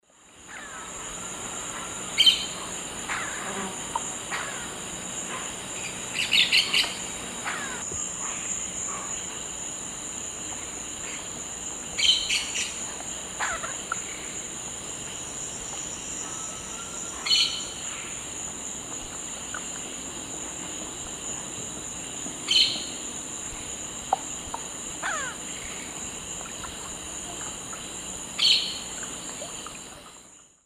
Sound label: Brotogeris cyanoptera, calls; S. America; Eastern Lowlands